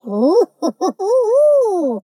player victory; fallguys

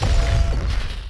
nv off

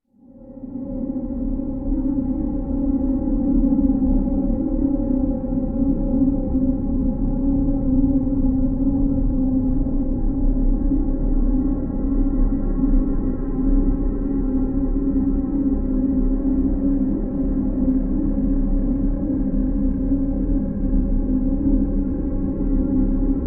hive amb

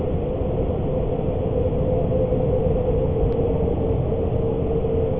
wind

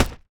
impact; fallguys

